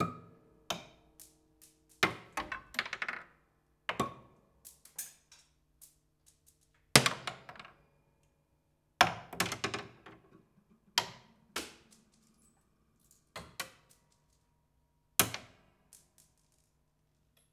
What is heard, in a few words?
Marble Lazy Bounce, Piano Destruction